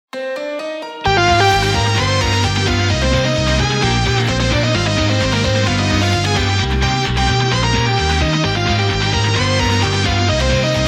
no drum

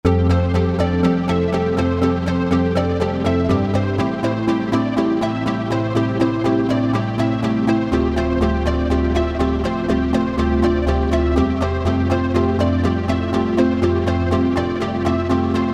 Arp

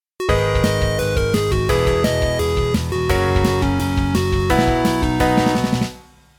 Piano

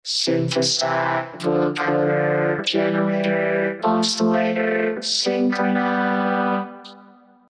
Vocoder V Sampler

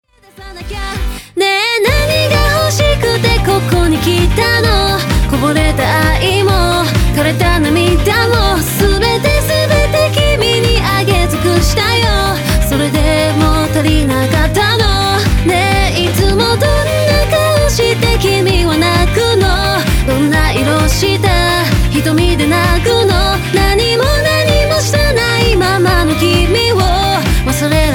Rock Female; CLA

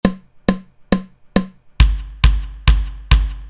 Kick hikaku

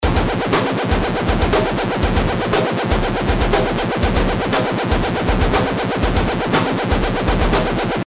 lfo

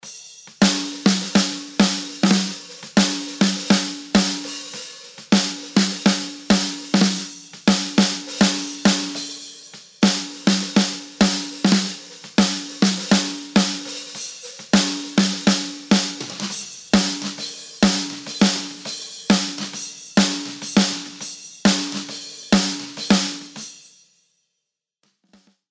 snare after

